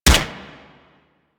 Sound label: Swarm Rifle Shot